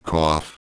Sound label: COUGH